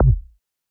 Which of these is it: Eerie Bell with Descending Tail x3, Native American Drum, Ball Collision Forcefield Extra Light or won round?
Ball Collision Forcefield Extra Light